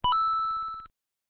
coin

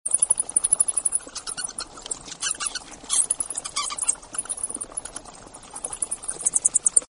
animals bats in the cave

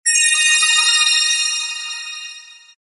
chime magic

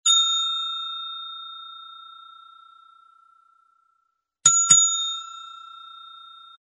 bell desk intermittent ring

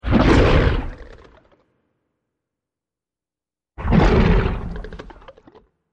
angry stegosaurus

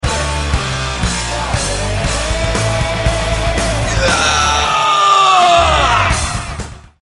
Wake Up Heavy Metal Music Alarm

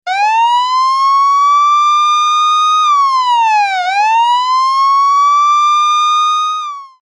Police Siren Alarm

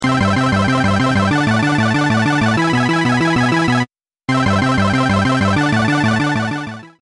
video game wizard rapid fire

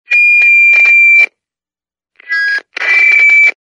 walkie talkie feedback interrupted

